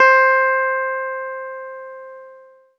ZITHER; Best Service; Alpine Volksmusik

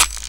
SD, Dance Mega Drums; Best Service

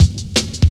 Steppy Cut, Jungle, Drum Loops 168.5; Zero-G Jungle Warfare 1, 2 & 3

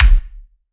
BD, BASS DRUMS; EdgeSounds

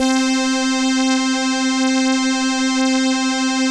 ELKA, String Machines; ELKA RHAP

